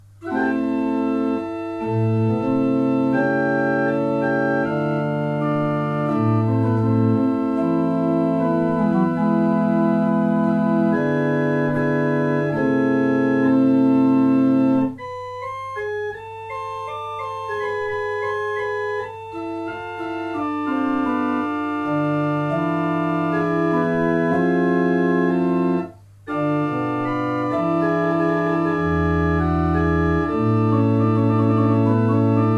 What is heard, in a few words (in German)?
Verschueren, gebrauchtorgel